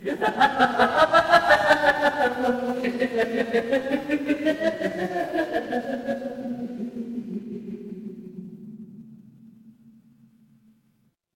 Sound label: crowd laughter